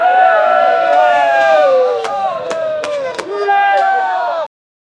a crowd of people booing

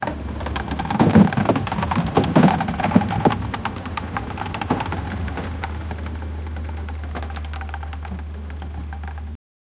wooden cart driving on ro; nature

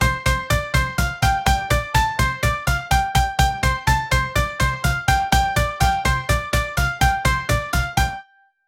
romantic proposal theme

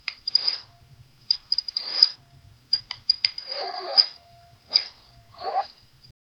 tiny saw cutting rope; farm; tiny saw cutting rope cre

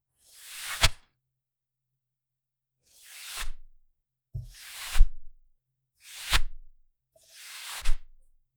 quick parchment slide wit

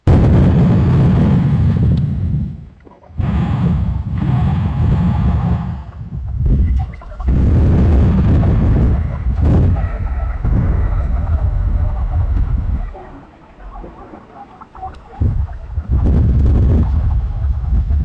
various volcano sounds

